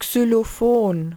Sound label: xyloph